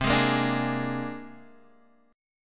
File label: C 7th Suspended 2nd Sharp 5th, C, down, Guitar, DADGAD